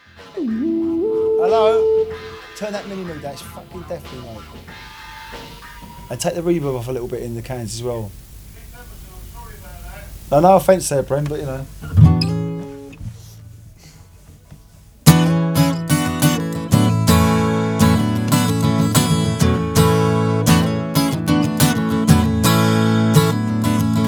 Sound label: gtr moog jam